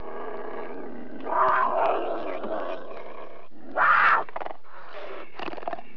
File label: Cougar growl and snarl; animals